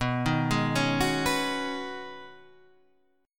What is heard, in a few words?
B 6th Add 9th B down Guitar Half Step Down